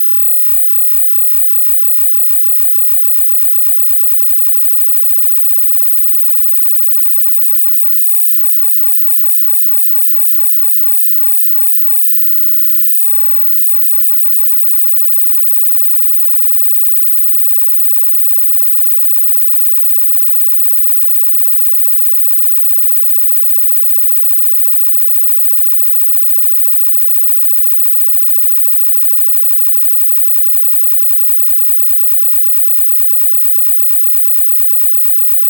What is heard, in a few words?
Electrical Interference Crackle; Glitchedtones; Noise Elements Electrical & Interference